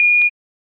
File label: screen beep